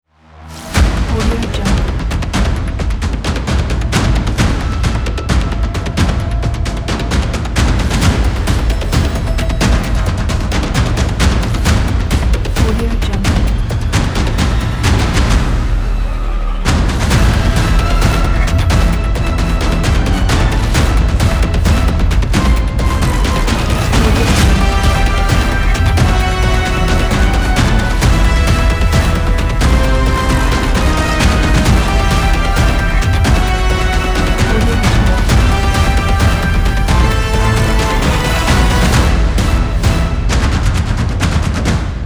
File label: the action trailer